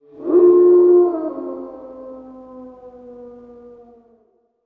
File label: jackal howl